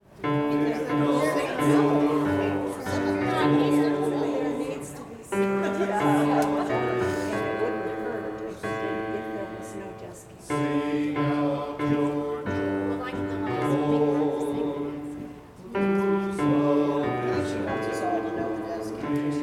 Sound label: bass; Gather Hymnal